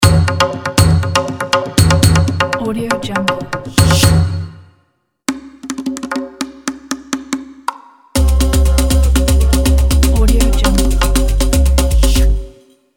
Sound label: summer afro love stinger